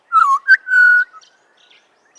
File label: bird stereo